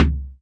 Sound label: Pearl SC-40 Kicks